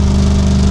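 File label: diesel generator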